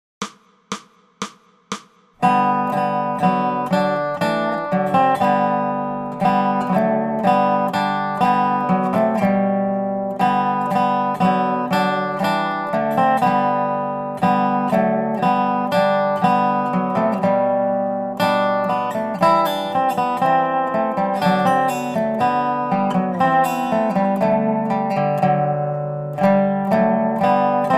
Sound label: Dobro; Angels We Have Heard on High; Dobro Tablature with Play-along Audio Tracks